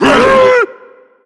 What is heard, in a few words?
pain, zombie vox; creatures